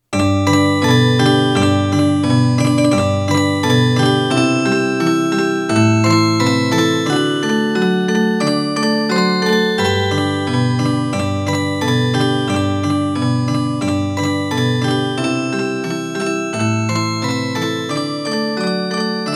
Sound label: Jingle Bells, Polyphon Christmas